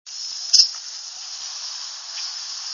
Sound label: kinglet ruby crowned annoyance call, Ruby-crowned Kinglet; Sandy Hook, trail near Boy / Scout Camp, 4/25/03